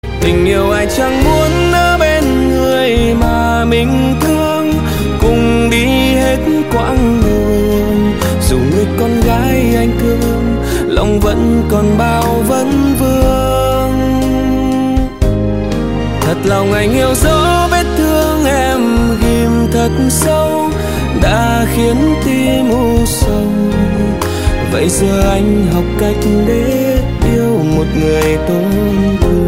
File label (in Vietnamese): Nhạc Chuông; nhac tre